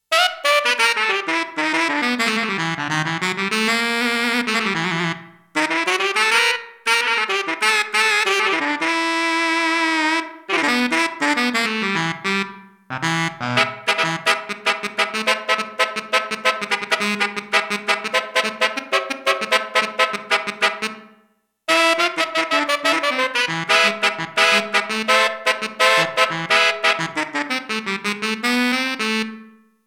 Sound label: SAX, Roland JX-1; Factory presets